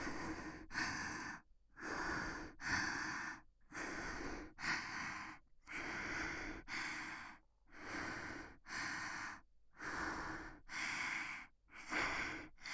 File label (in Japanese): 吐息